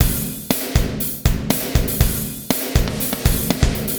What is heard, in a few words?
120 POWER, drums